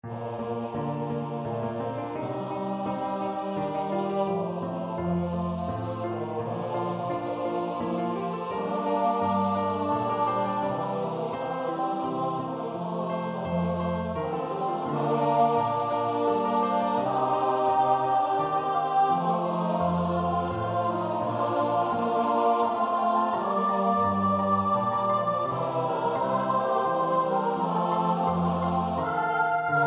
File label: sanctus mass bb K275, Choir